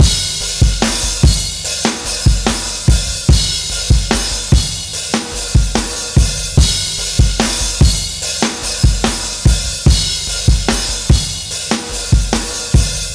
73 live breaks

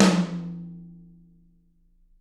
TT, Toms; Bob Clearmountain Drums I

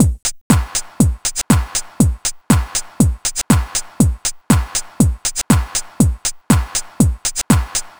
120 trance beat, Drum & Perc Loops

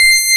PULSE MOD, Prophet Five; E-MU Formula 4000 Series Vol. 3; Analog Odyssey

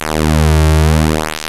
PULSE MOD

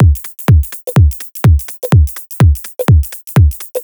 B 125bpm, House Drumloops; 90 s Sample CDs; Zero-G House Fabrik Drumloops; ZG Drumloops

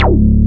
RESO BASS; SAMPLE WAVE MIXING 1

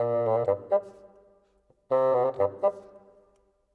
Bassoon, Orchestral; Sonic Foundry (Sony Creative Software); Orchestral VOL-2; Modern 27

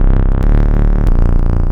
Synth Bass

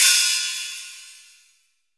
METAL HHO, Drum Kit; Drum Kit1

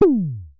Synare Filter; Star Instruments Synare 3

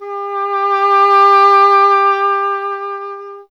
WND, Solo Instruments

